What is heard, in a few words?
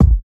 Kick Hit; Hip Hop Exotica; big fish audio